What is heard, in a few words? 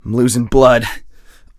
bleed in; Escape from Tarkov